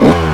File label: throttle blip med, YAMAHA